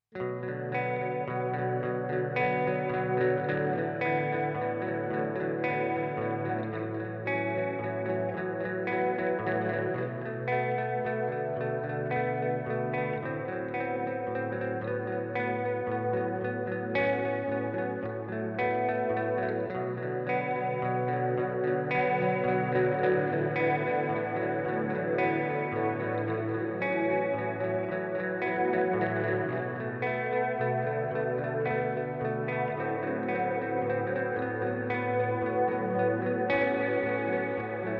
Modulated Reverb GFI Systems Specular Tempus

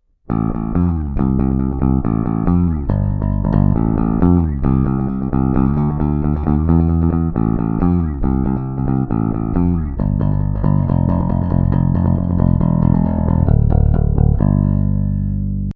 Harley Benton BZ 5000 Both PU passive Plec

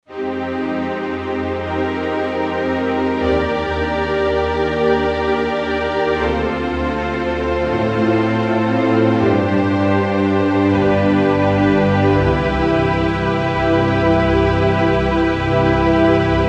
Kawai ES 520 Strings Ensemble

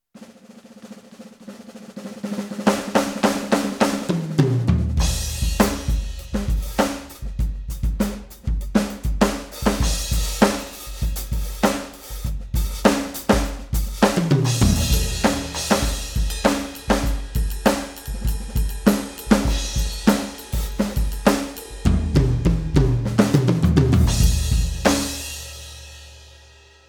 PEARL eMERGE Modern Soul Wet Ambience

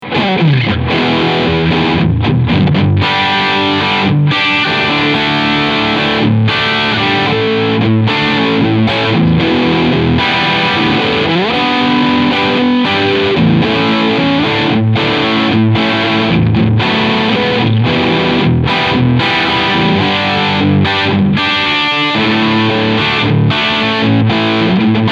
Harley Benton BM 75 Trans Red Riff Steg PU

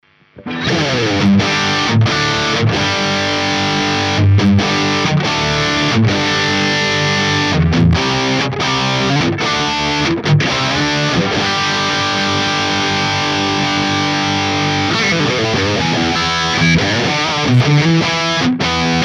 rhythm Chords; Ampero

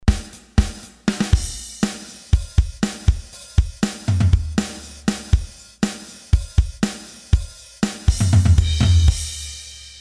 Loop Toms; Samplitude Pro X6 DYN

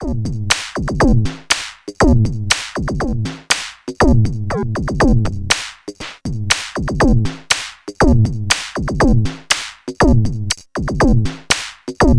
Transient Plus RING Mod OSC1 slow AM OSC2; Future Retro

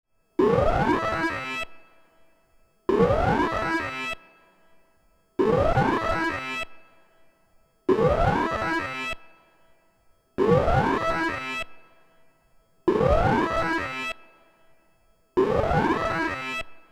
Serge Crocodile Peak and Trough

